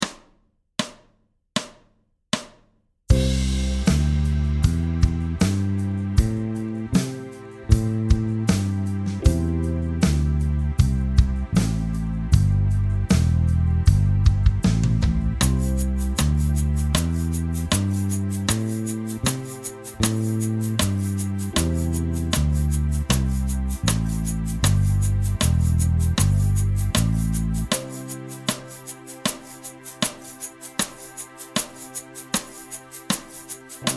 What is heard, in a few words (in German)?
C Dur Pentatonik 78bpm